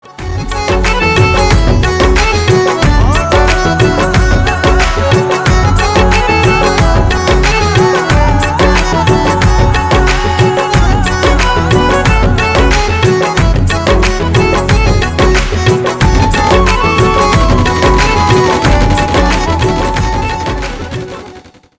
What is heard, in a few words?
Instrumental